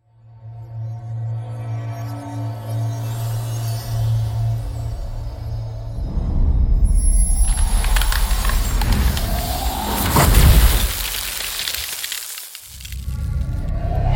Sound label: Magic; BOOM Library